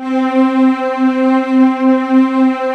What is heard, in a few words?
LUSH STRGS, JV PADS; Pro-Rec - Synthsonic